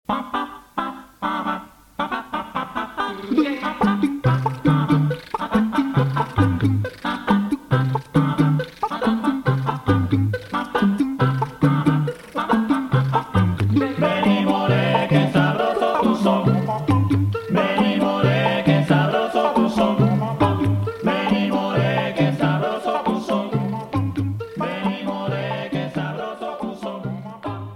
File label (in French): musique Canto Vocal Sampling